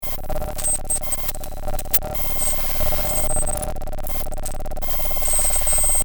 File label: pickup